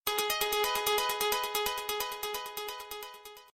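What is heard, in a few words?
standard SMS on Sony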